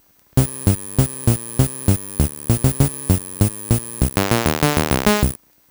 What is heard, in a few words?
orig un filt, sound board; mylstar 2nd generation sound board fully reproduced on fpga; screwloose